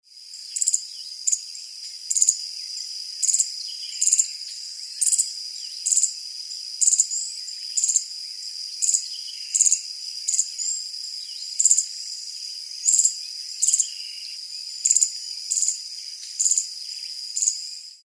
Cranioleuca obsoleta short calls; recorded Santa Ines, Departamento Itapúa; Myriam Velázquez November 2001

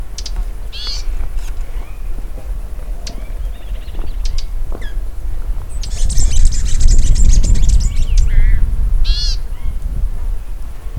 Polioptila dumicola song

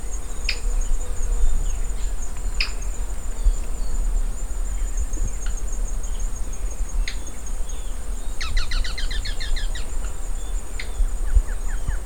hemitriccus margaritaceiventer

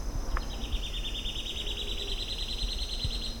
Conopophaga lineata

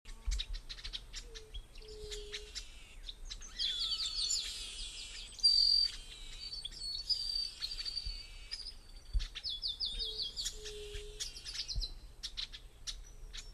Chrysomus ruficapillus

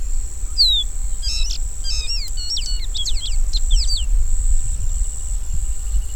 Sporophila bouvreuil song

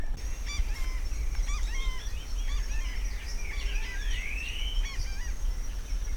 Phaetusa simplex, yacyreta

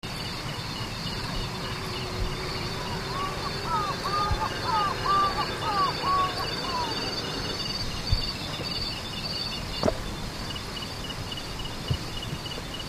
Gallinago undulata; Song and wing sounds during display; recorded Aguara-Ñu, Mbaracayú Biosphere Reserve, Departamento Canindeyú; Juan Mazar Barnett November 1997

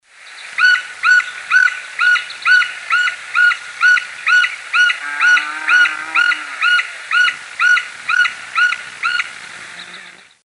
Colaptes melanochloros melanochloros

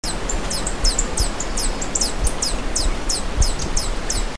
Phaethornis eurynome

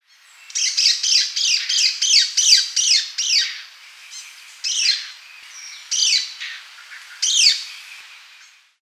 Philydor atricapillum alarm

